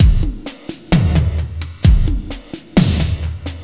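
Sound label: drum loop; Descent II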